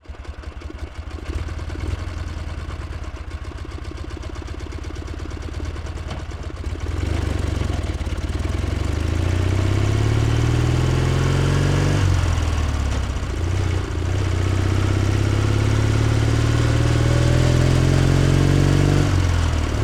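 Dnepr M72 Onboard Drive Gearshifts Stop Off Engine Mix; Ural Dnepr M-72 1944; Pole Position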